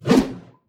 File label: swing blunt; blunt1hand